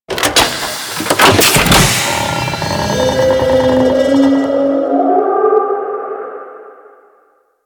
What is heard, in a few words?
Sabotage Reactor Fuel Cell Insert; Warframe; Missions